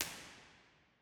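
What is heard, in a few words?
impulse response, Lady Chapel St Albans cathedral; b-format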